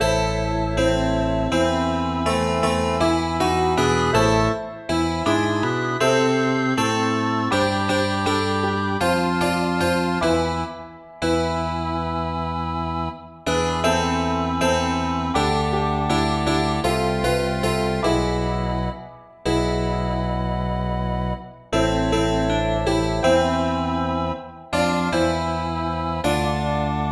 steinway harps el piano rock organ

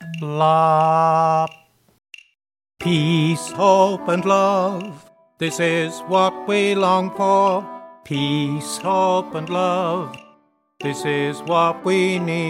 Bass sung

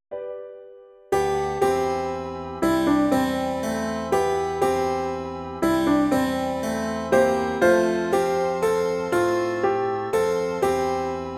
SATB instrumental

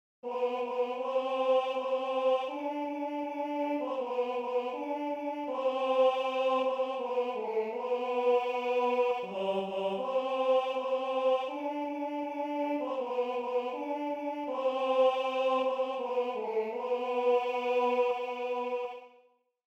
tenor

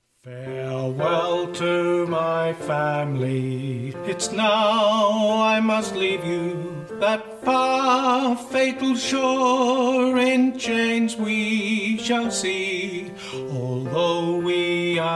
tenor sung

